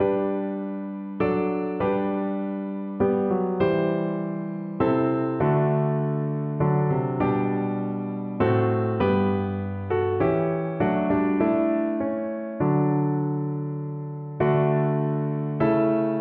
PNO

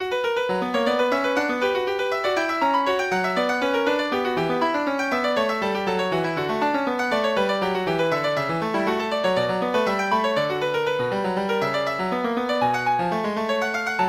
french suite allemande, piano

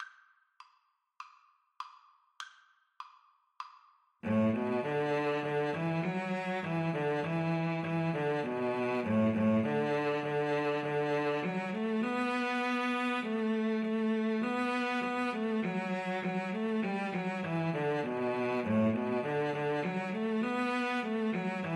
loch lomond Cello duet

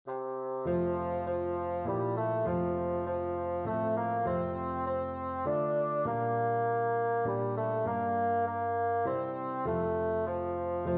bassoon

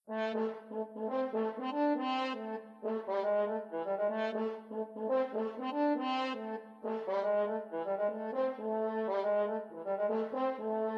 french horn

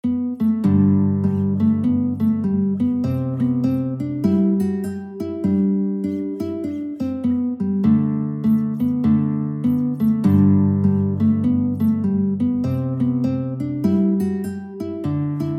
guitar

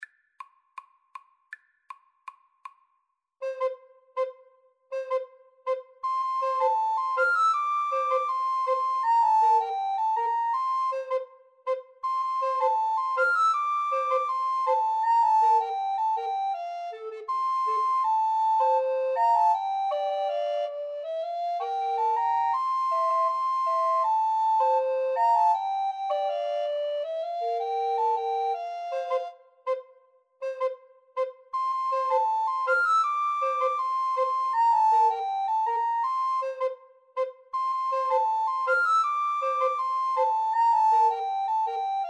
linstead market, Recorder Trio; Jamaican Trad.; SAB

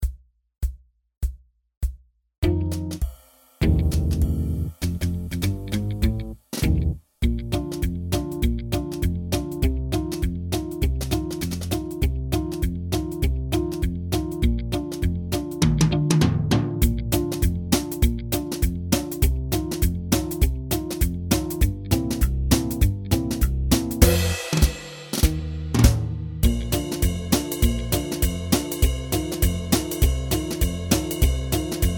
ASAX

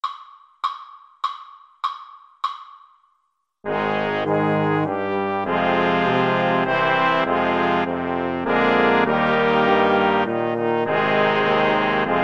star spangled banner, Saxophone

